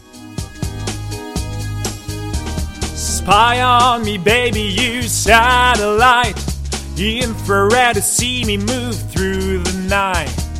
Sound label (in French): chant, Chanteur